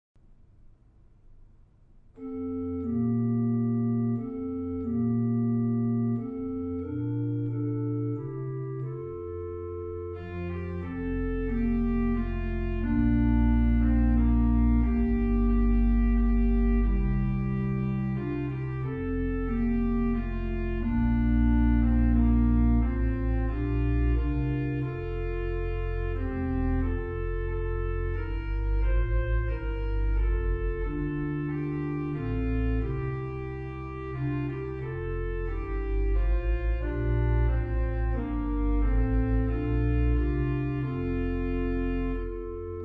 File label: Backing; festival choir